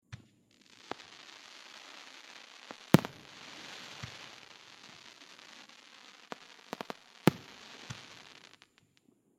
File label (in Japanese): 花火の音 チリチリ; firework